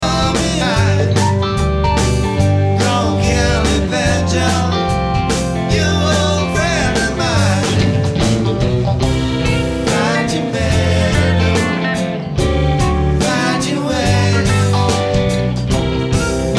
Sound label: Banjo